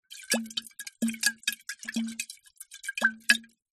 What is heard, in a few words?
food drink wine bottle shake lid off wine inside; zapsplat